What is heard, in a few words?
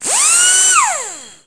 ambient machines pneumatic drill; CSS